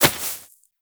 rodent hop step rear grass, forest rodent footsteps; Foley; Warframe; Characters